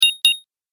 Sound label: Double Click Sound; object sound effects